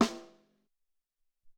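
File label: Piccolo Rim, Alesis SR-18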